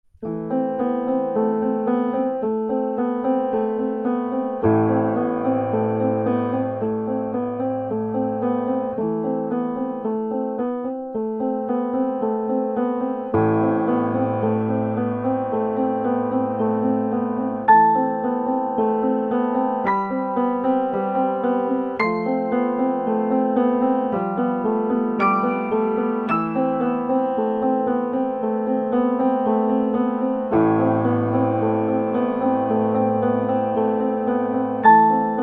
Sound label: Contemporary Slow